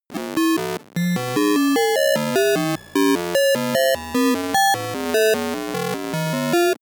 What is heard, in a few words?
broken computer high long